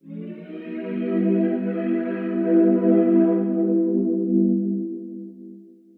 Sci fi, techno drum fx single hits

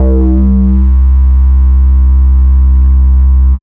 Bass B C2, drumnbass 170bpm; musicradar; Bass B Multis

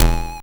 YM Kick, DRUMS; KICKS; ChipShop Deluxe; ChipShop Hits

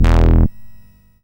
SID Bass E, chip; CHIPSHOP HITS

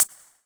Closed hat, techno drum

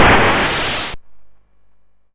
YM Noise; NOISES; ChipShop Deluxe; ChipShop Hits

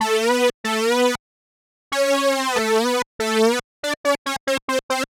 Synth Line 94,hiphop samples; musicradar

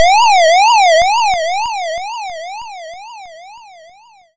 YM Siren; SFX; CHIPSHOP DELUXE; CHIPSHOP HITS

